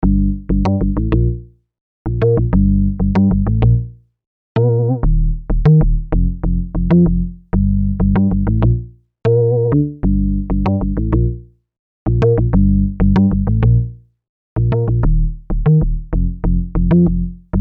bass synth; MV-8800; alpha j

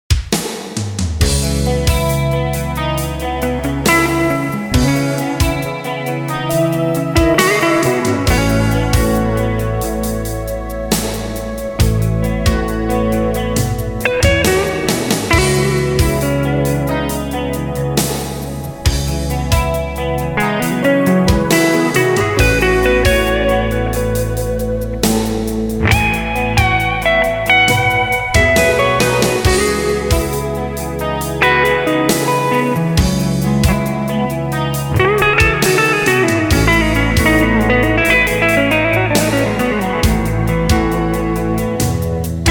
black panel; Micro Cube red

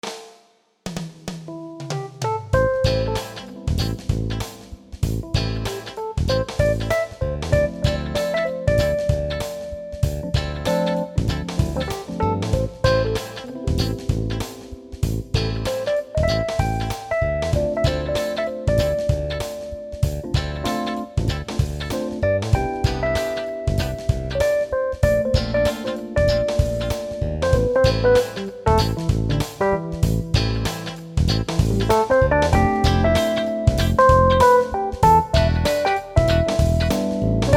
fp-4 fp-7 session partner

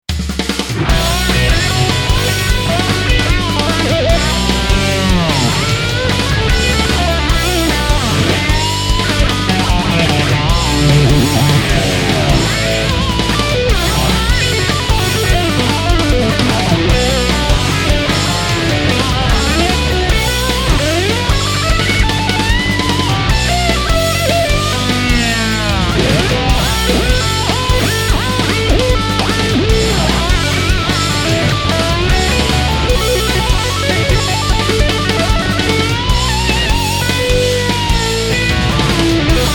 phase lead

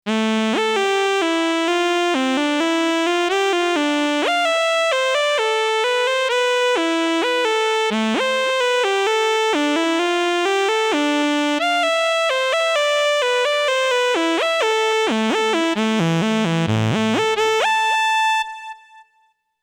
fat saw lead

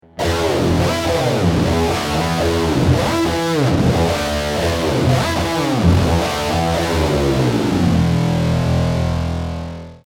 pedal wang bar

